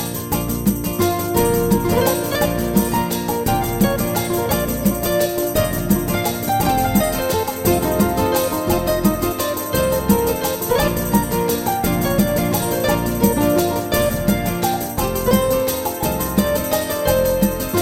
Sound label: Latin 3 4